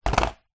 sport skateboard small plastic childs down on ground